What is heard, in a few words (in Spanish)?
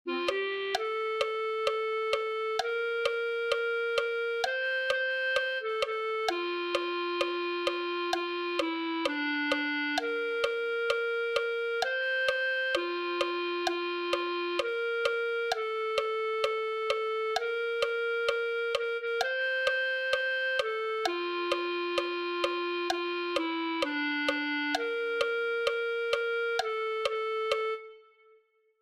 clarinete